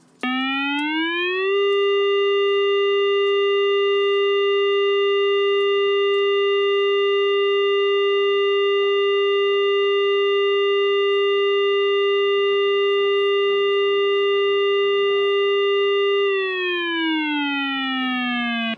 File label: Siren; PennSOS; Penn Siren Outdoor System